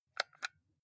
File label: console controller xbox xyab press soft